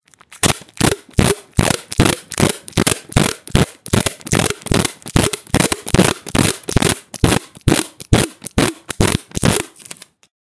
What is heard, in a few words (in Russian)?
zvuk skotcha